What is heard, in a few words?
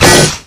bolt loose; Titanfall 2